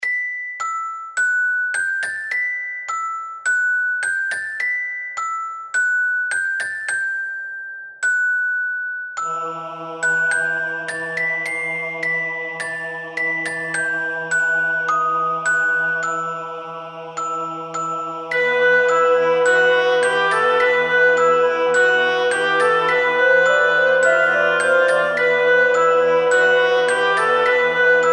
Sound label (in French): Boite a musique; Lacrymosa Industrie